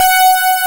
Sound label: SYNTHESIZER ANA LEAD; AKAI S6000 CD-ROM - Volume 1